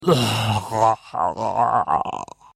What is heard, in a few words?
Kingpig Waiting; bad piggies; android; mobile; windows